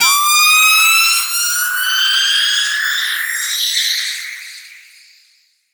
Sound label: Rise; SFX; 7rystan's 600+ FREE EDM SAMPLES & DRUMS